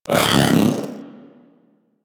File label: Vocal Attack Melee; Warframe; Pets; Zanuka; Zanuka Companion A